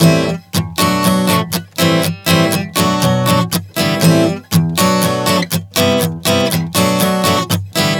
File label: Am-Bm-Cmaj7 120 bpm, acoustic guitar chord progressions